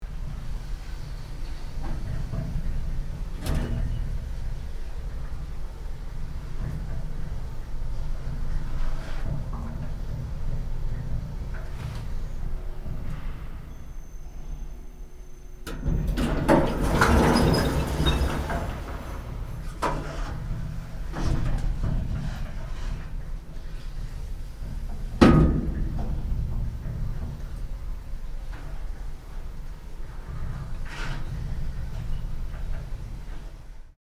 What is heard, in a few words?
Service elevator moving hum inside perspective